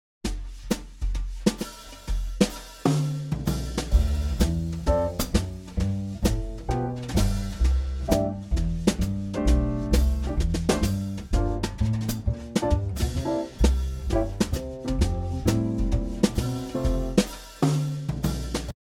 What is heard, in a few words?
Dm7 G7 long